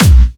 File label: Clubby Kicks, Bassdrums